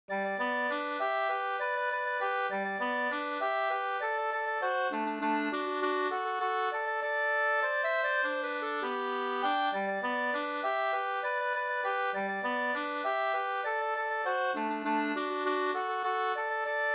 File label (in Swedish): polka